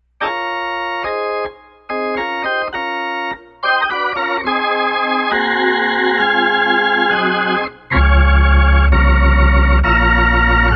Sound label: 122xb Gospel, hammond organ